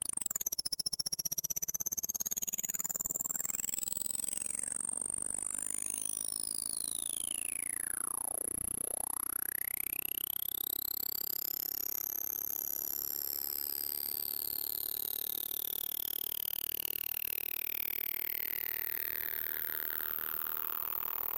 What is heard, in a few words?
elastik stretch